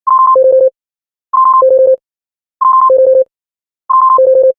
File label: Clear and nice